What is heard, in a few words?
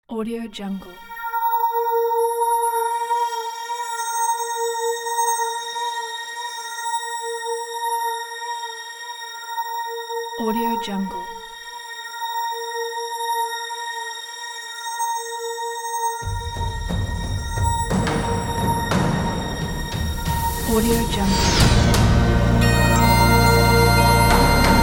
tense atmospheric hybrid trailer